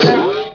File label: Viking Axe Impact; worms